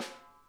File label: snare, drum kits